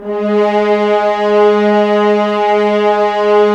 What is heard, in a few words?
Horns; Roland - LA Composer vol. 4